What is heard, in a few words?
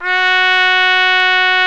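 BRA TRUMPE, trumpet, brass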